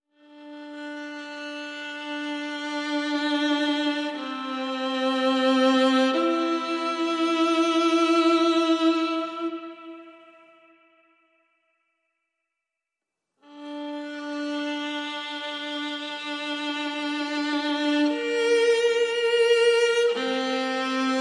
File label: String Only Mix